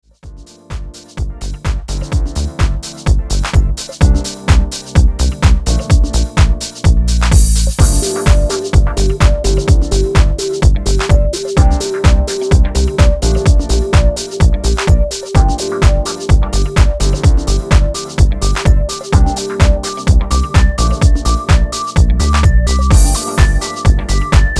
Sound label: Original Instrumental mix